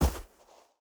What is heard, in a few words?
footstep burlap sand jump land; Rust